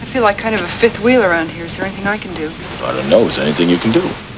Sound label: wheel